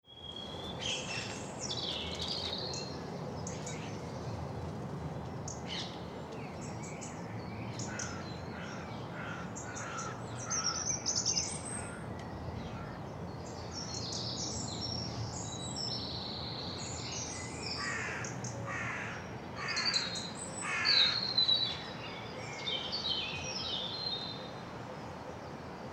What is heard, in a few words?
Spring Morning In South East London